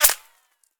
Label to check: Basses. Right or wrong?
Wrong